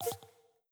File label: tab transition; steam; deck